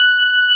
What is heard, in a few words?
Rhodes Single; E-MU Producer Series Vol. 5 – 3-D Audio Collection; 3DSprints; 3DRhodesSingle